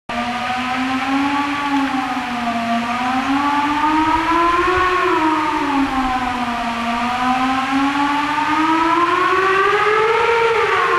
Lunkenheimer Fire Alarm, steam whistles

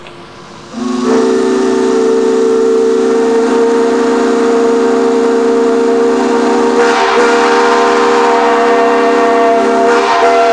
6 chime & 3 chime, steam whistles; C&O

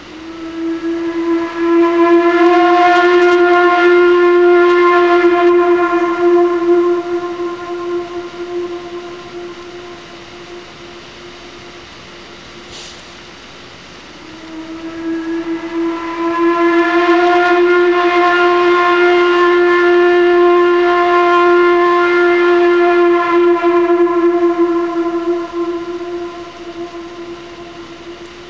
single chime hooter, steam whistles; C&O

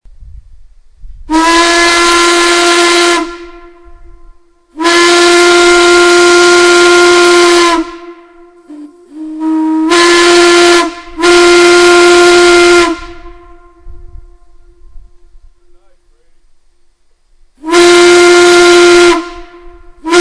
factory whistles, steam whistles

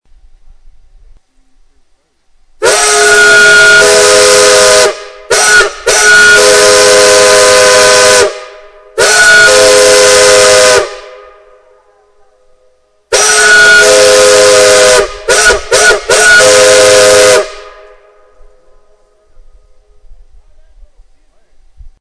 3 Overblow, steam whistles

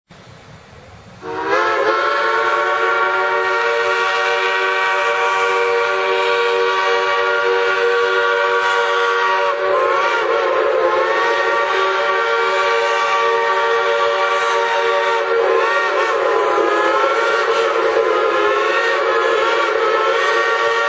3chime, steam whistles; crosby

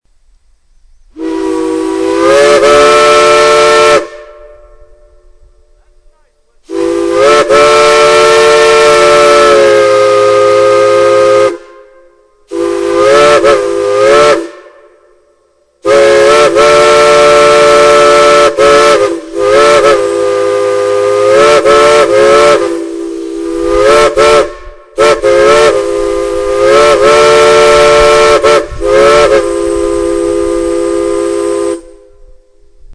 3 chime, steam whistles; B&O 3 Chime on 190psi Steam; This is a great opportunity to hear the sound difference produced with steam and air.; cass railfan

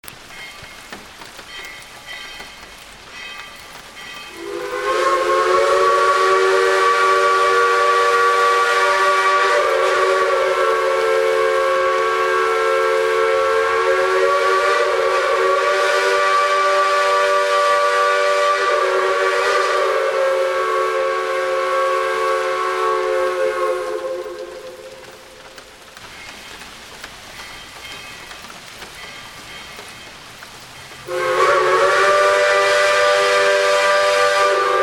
3 chime steam; carys southern; Here is a nice recording by Cary Atkinson down at Dollywood

